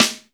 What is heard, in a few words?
FUSION KIT; Northstar - New Gold VOL-1